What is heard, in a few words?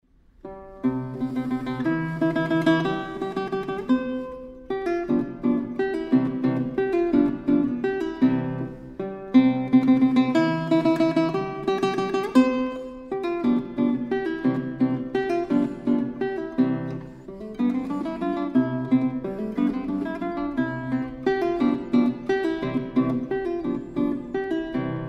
Valtz In G, Guitar; Paganini